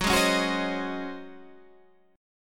C sharp Major 7th Suspended 2nd Suspended 4th, F sharp